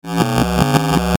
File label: Logo Analog Bass Synth; Sonic Logo